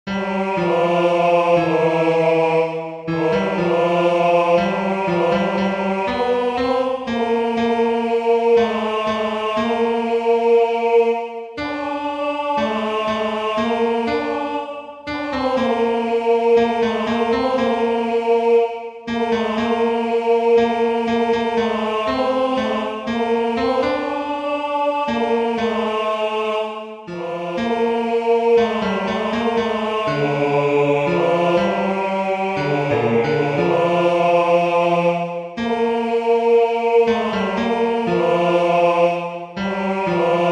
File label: tenor